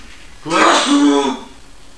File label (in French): atchoum